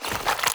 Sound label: Sticky Ink Tentacle Retract; Gameplay; Mutator; Warframe; Shadowgrapher